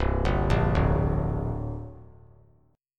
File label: C 7th Suspended 2nd C down; C7sus2 arpeggiated; JGuitar